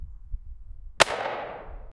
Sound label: ak 47 shot sound